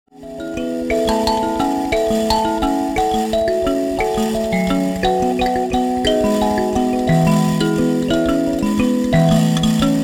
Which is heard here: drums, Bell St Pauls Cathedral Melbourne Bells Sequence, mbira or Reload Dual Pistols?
mbira